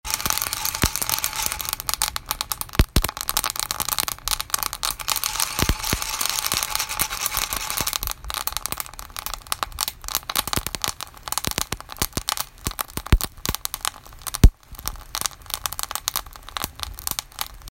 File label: explode